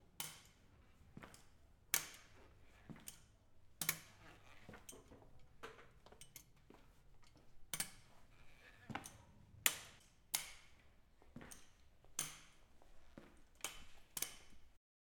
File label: Walking With Crutches